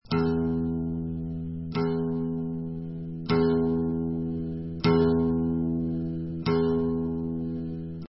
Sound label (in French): accorde, guitare